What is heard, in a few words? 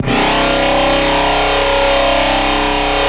Noisome, Noise Osc; Cymatics; Shapeshift